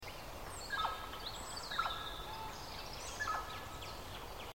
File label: Garrulus glandarius; SETE